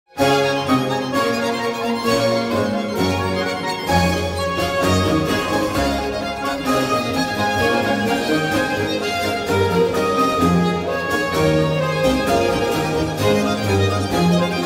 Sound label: orchestra ringtone